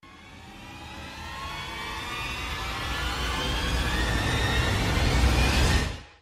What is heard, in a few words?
riser, transitions whooshes